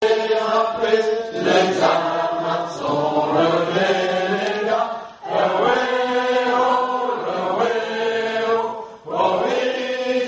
couplet refrain tous; Haul Away

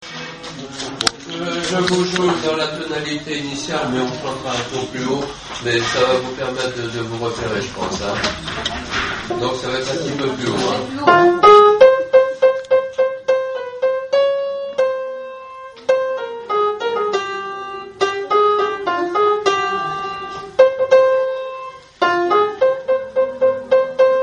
instrumental couplet refrain

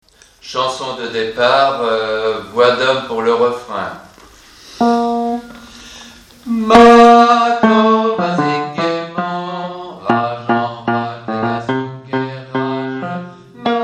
chanson de départ refrain hommes

